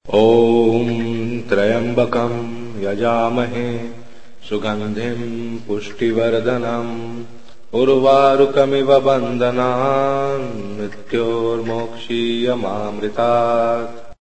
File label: mrityunjaya mantra